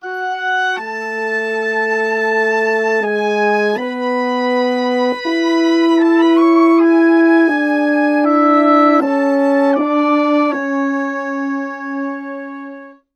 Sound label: 80 brasses woodwinds, F#min, Orchestral arrangements; PowerFX - Downtown Orchestra